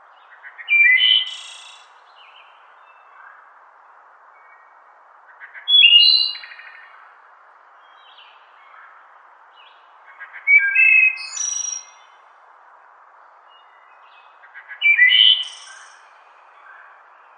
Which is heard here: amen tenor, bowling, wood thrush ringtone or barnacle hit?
wood thrush ringtone